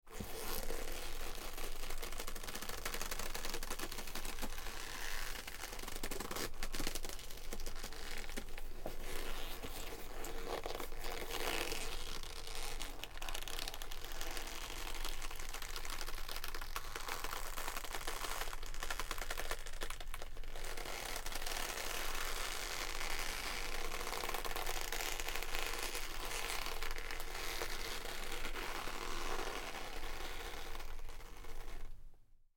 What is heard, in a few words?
Clothes Leather Jacket Twist Long Creak Omni Mic; Guild Wars 2 (Original Foley Soundtrack)